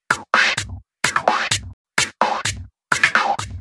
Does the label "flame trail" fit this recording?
No